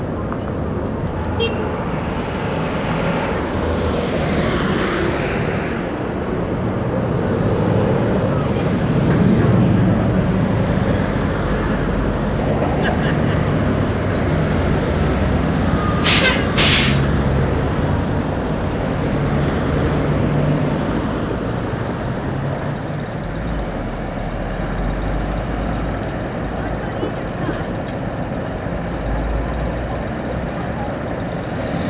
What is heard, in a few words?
city traffic, ambience